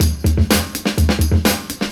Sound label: Drum loops, Funky